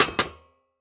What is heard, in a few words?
Foley Footstep Hard Sole Dress Shoe Jump On Metal Platform; Foley Footsteps